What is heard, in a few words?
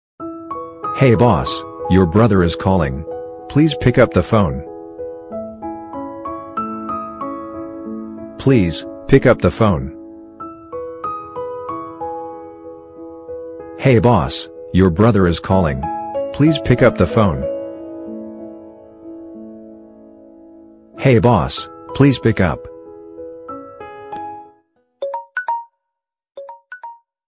call ringtone